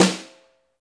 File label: Chuck Brush; EMU PX-7